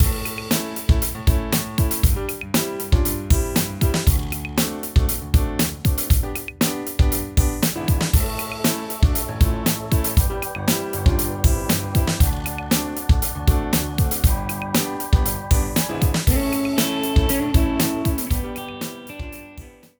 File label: Sweet Bouncy and Bright